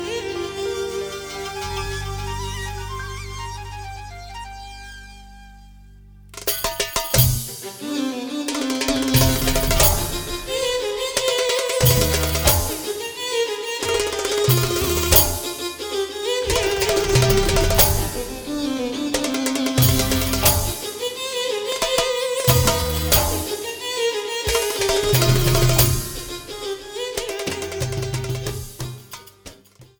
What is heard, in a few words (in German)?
Baladi Violine